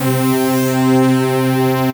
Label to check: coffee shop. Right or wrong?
Wrong